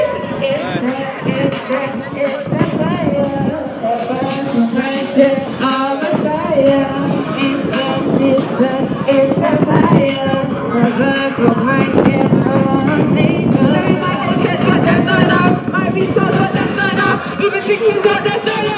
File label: teenagers rapping with a sound system on kingsland highsteet